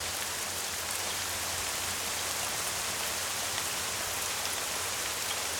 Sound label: rain, ambient weather